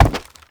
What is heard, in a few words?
Step Wood; Warframe; Uriel; Movement; Steps